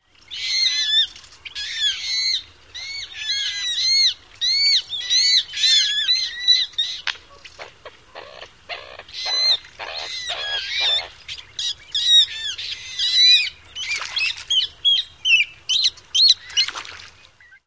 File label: juvenile squeals